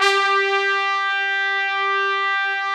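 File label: TRUMPET, Brass; AKAI S6000 CD-ROM - Ultimate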